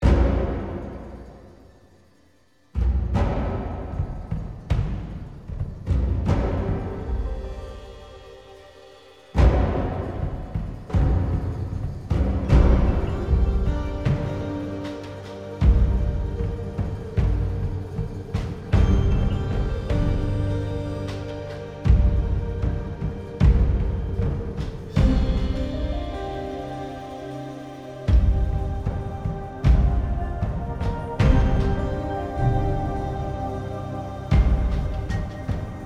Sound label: Forest Combat; Dales; Intensity